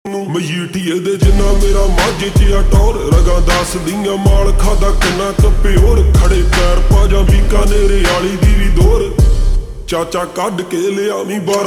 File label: Ringtone Slowed Reverb